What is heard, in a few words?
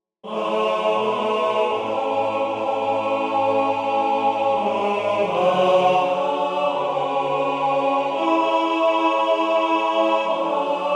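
Swallows tenor, wisdomchant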